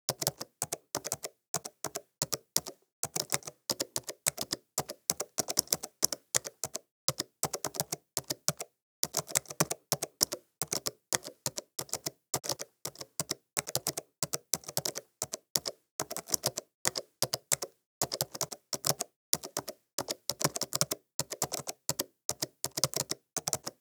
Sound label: slow typing on a keyboard; social-ear